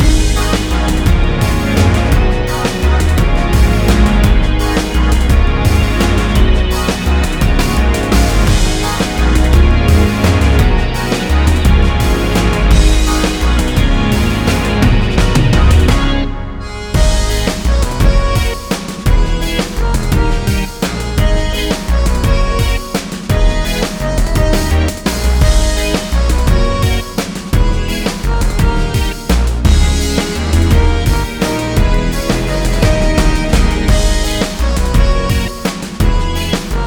BGM STAGE

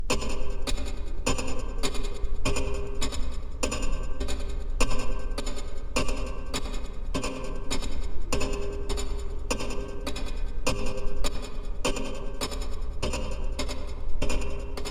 Sleep clock; Five Nights at Ronald's

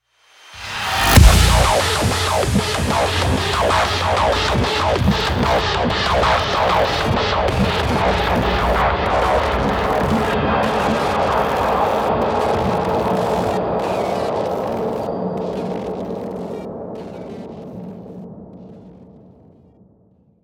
Main Heavy; Doom Hunter Base - Main Heavy 18b

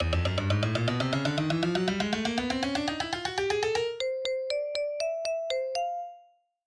Level complete; Absolutely Unstoppable MineRun; Wii U

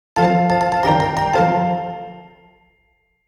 Picked up an Item!; Pokémon Let's Go Pikachu and Let's Go Eevee

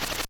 figure closeup; Namco Museum Archives Vol. 1

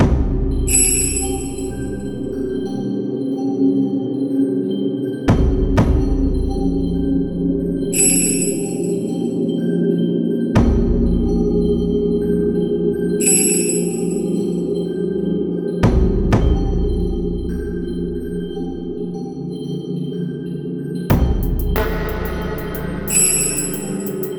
Dungeon

